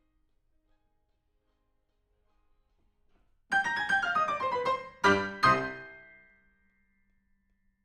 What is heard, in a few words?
PIANO; TANGO